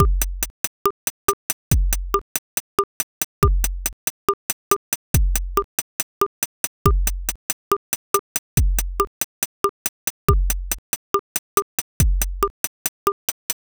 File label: bossa nova pattern